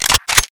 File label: famas forearm, weapons